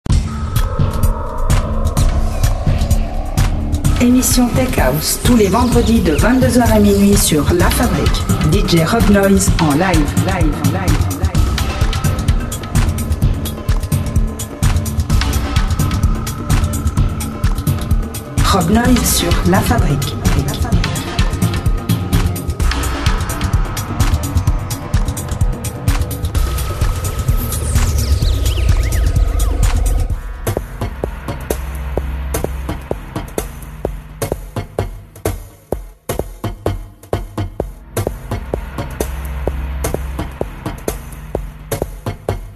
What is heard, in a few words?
Noise